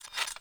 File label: Foley Action Metallic Punch Fill Short; Arm